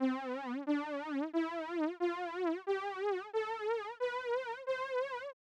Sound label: synth detune